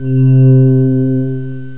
bowed glass, synth pad; yamaha xg

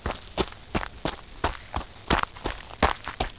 running; 12000 Graphics Pack - A Complete Graphics Collection For Your PC

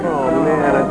GameOver; Blit! 1.0; Macworld (1996-04); Shareware World; Entertainment

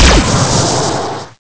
fireball; Grounch

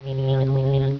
thinking; Odyssey

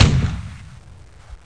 CANNON; Total Chaos Nukem 3d Pack; duke

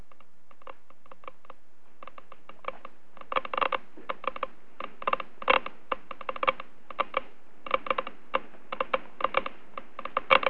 geiger

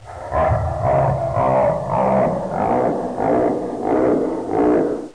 fangs

